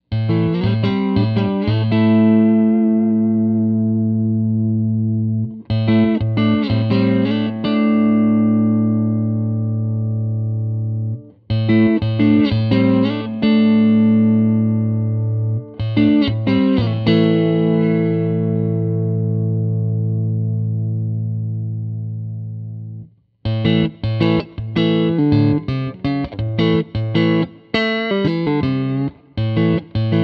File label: bridge, custom pickups